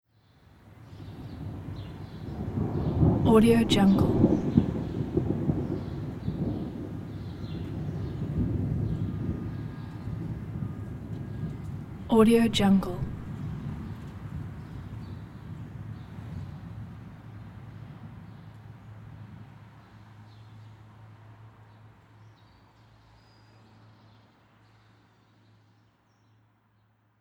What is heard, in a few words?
low thunder